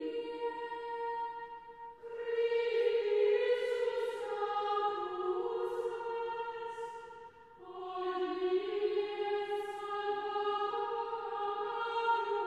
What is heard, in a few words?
Chant Antiphon; Traditional; Chester Processional